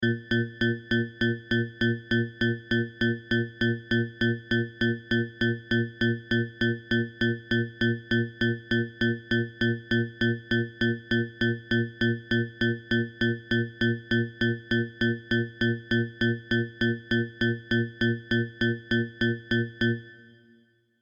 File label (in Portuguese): amostra de pulsos com periodicidade